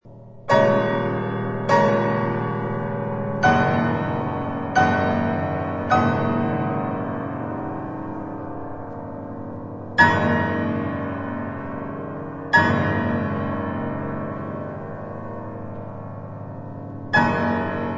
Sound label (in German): Klavier